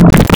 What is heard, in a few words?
HORROR; WaveEdit; wavetable editor; Synthesis Technology